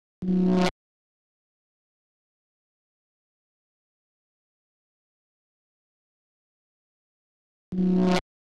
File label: 128 BPM F# Min Reverse FX; House; Drop Loops; Cymatics; Mothership